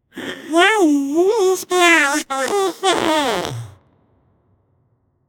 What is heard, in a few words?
a swedish man makes a, fart